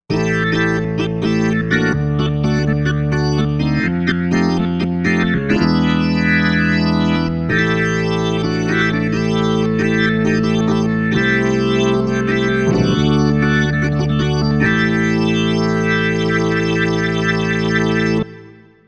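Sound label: Organ Rock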